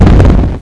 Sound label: shot building near; Bolo